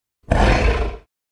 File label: dragon